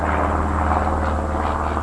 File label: Airplane; Working Model Program